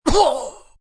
Grunt Breathy, Grunt, groan, impact, male, wind knocked out..., pain, hurt; Groaning Sound Effects; human voice